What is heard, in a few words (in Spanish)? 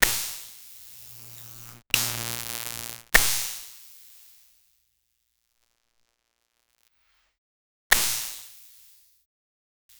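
chispa eléctrica breve, electric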